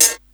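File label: hi hat; USB Soundscan vol.31; Miro s Grooves; AKAI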